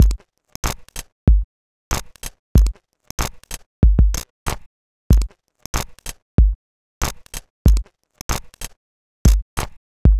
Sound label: Subtractive Beat